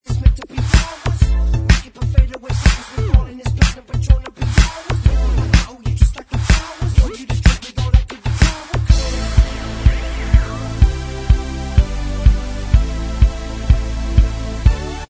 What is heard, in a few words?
Bvox Track, backing tracks